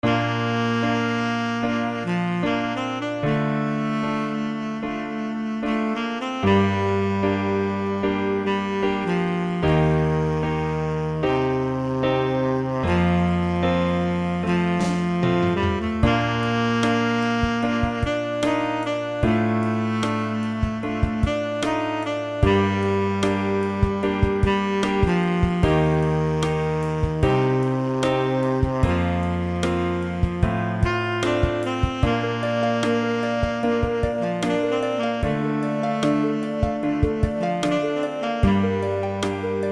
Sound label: b flat sax